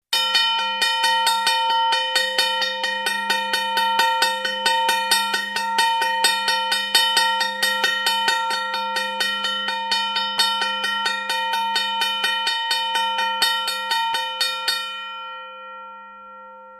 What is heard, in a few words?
Railroad Crossing Bell; Crossing Bell Steady With Slight Slowing To Stop, With Last Bell Ringing Out To End. Close-up.